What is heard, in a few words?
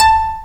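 buff stop A1, harpsichord; AKAI S-Series CD-ROM Sound Library VOL-2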